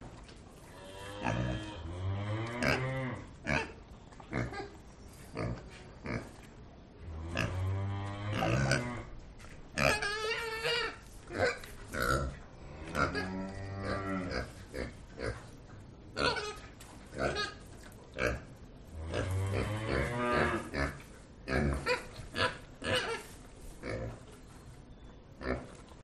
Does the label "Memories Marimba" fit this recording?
No